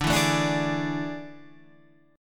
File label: A Major 7th Suspended 4th D